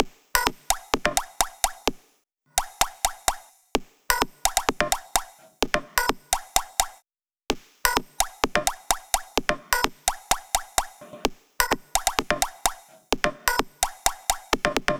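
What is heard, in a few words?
Drum Loop 128BPM Percussion; VEC5 Drumloops; VEC5 Multi Loops 128BPM; Loop Elements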